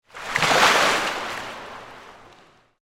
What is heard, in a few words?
Pool Indoor Splash, Water; Pools; Backgrounds